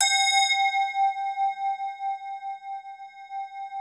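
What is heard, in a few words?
glass tubes; Sound Gallery - Charged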